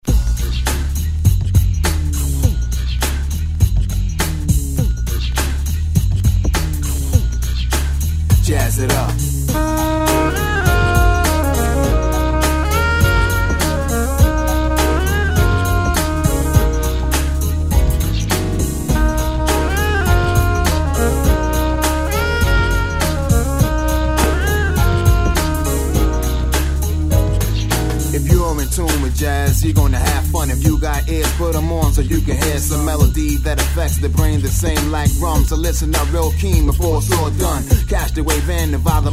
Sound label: Hip Hop Jazz